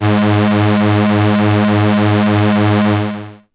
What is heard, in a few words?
bass G sharp 2; multisampled; must destroy you bass 3